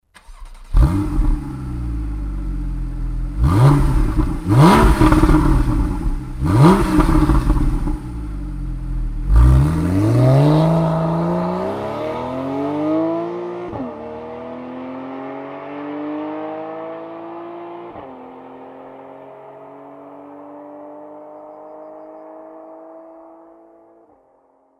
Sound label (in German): 911 carrera s sportabgasanlage; 911 991